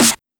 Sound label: Snares; osu